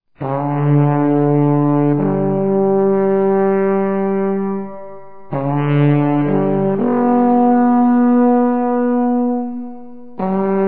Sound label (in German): Alphorn